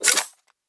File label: Weapon Foley (Rocket Launcher)